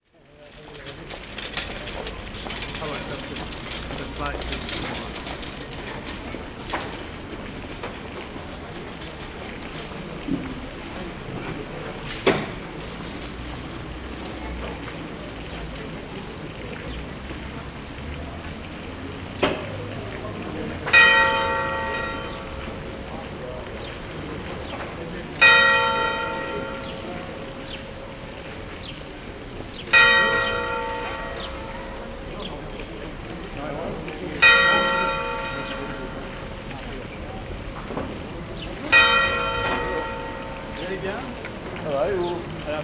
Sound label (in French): horloge saint leger